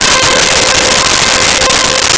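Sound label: Brass Section C5; Nintendo; July 2020 Gigaleak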